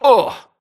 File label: grunt hits; Titanfall 2